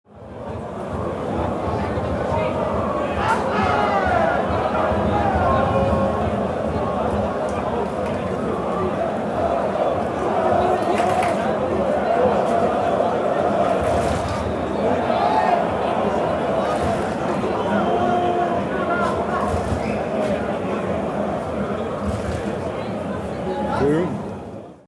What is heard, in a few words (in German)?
Stadion Atmosphaere